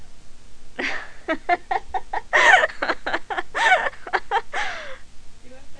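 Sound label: vocalized inspiration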